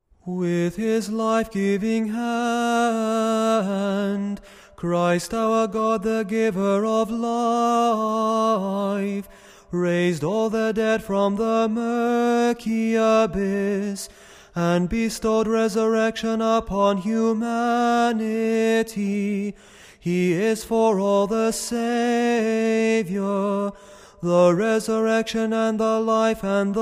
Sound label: Tone 6 kontakion